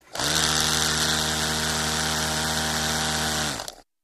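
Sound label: Motor Small Vibrate, variable speed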